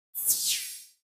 Butter Fly Summon; jungle spirit

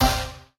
scatter short; spinsane

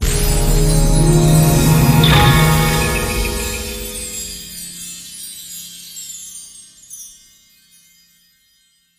free Spin Start; secrets of christmas

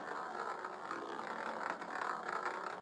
ball Loop Inner, roulette; advanced-roulette

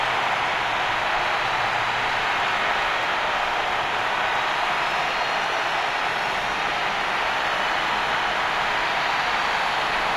ambience General, football

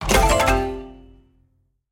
explode wild multi; serengeti kings

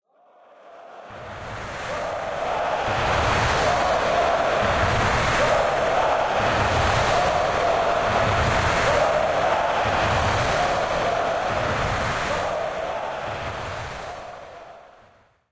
crowd Chant Germany, football

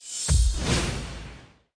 popup Win; jacks or better multiple hand

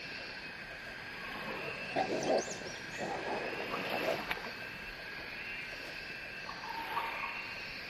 splash Free Spin; warlords

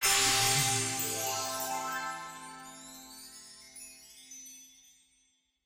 large win; druids dream

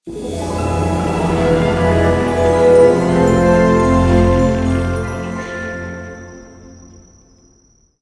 intro Bonus Game; fairyhansel

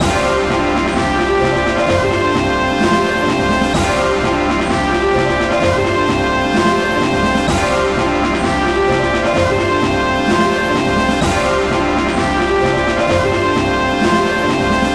big Win Loop; mythic maiden

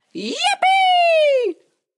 win jipiiiiiie; goldentavern

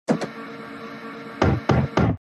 spin Button; mega joker

